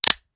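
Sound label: pistol raise first hammer; gmod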